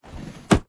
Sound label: drawers dresser close